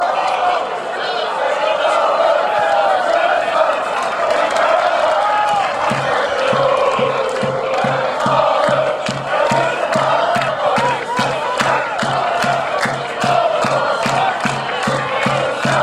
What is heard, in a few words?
clubsong, fanchants